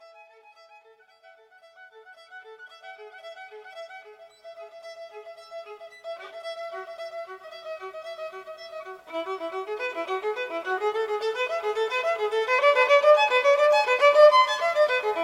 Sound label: solo praeludium; bach